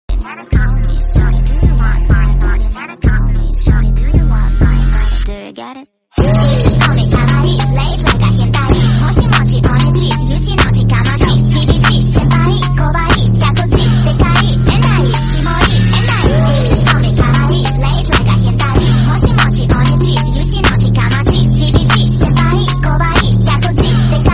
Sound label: sped up dj remix